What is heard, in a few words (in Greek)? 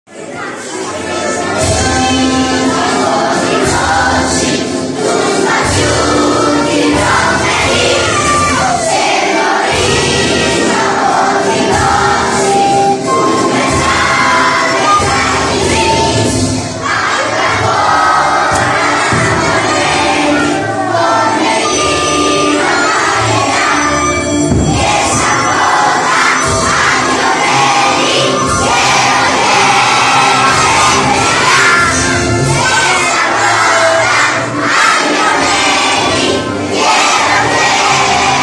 ΕΘΝΙΚΟΣ ΥΜΝΟΣ